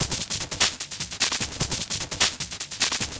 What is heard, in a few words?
Beat, Industrial